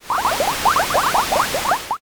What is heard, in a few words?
boiling